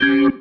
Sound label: Stab, Organ, One Shots